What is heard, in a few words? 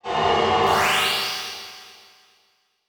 Transition Whoosh Laser; Production Element; Title Transition; AdobeCC